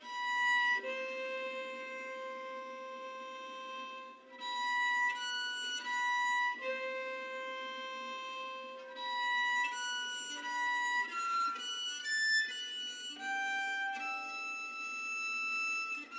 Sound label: tick